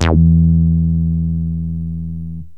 rezo bass, yamaha rm1x synth bass and lead

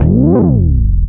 BASS FX; SoundFactory

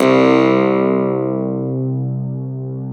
CLAV, PIANOS; SoundFactory

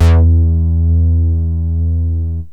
bass, yamaha rm1x synth

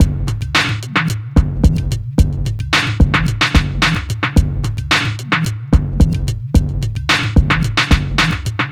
Electronica Drumloop; Drums

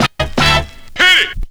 Turntables

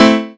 PIANO; SoundFactory

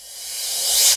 Cymbal One Shot; Drums

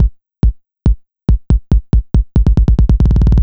Kick Loop, Drums, Dance Beats

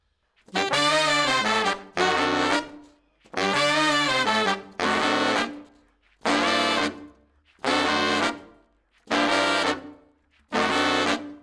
Horns Section

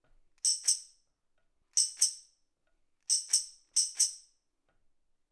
Acoustic Percussion

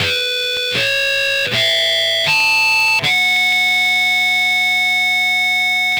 Electric Guitar, Loops; Guitars

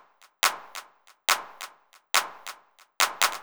Snare Loop, Drums, Dance Beats

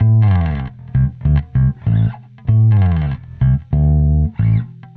Electric Bass; Basses

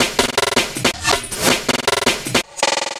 Old School Break, Drums

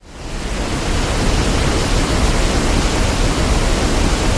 waterfall; theGoonies; Retro

